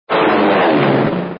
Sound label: Zoom